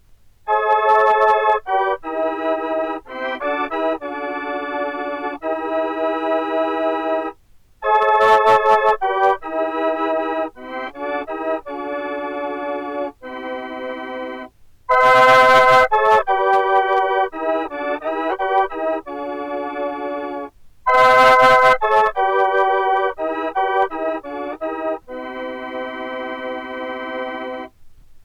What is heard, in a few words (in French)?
soprano transpositeur, flute a bec